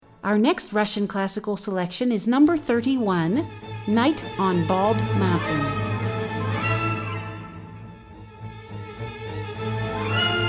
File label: night on bald mountain, classical